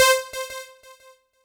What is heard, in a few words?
C4, synth line; 90 s Sample CDs; NiceBeats; SynthLine VOL-3